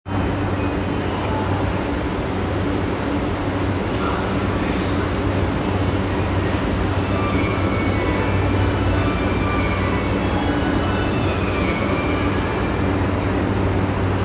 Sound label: train sounds; 南北線東大前南